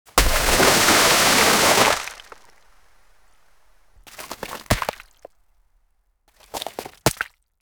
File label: large sheet of thick ice, deep water